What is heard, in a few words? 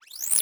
Multimedia Internet CD-Rom Flash Pop Up Short